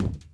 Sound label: footstep walk metal